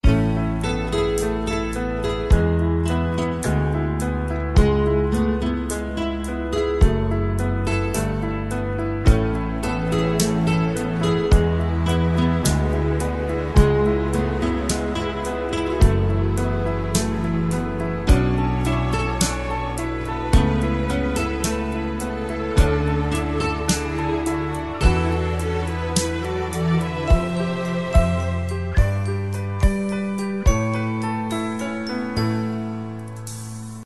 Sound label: fishing music